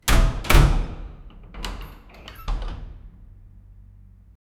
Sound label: steampunk door closing; doors